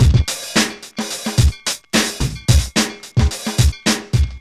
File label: BITING 109, Funky Elements loops; Zero-G